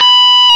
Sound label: GTR FUZZ; Club-50 - Foundations Roland; Dirty; Stack